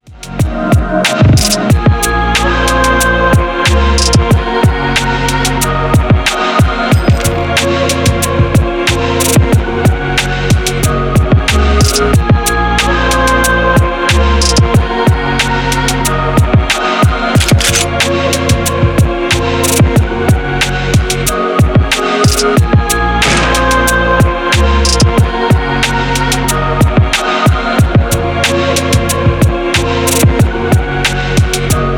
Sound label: rock